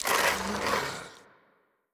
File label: zombie scratch, brickwall